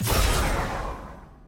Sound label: click; naafiri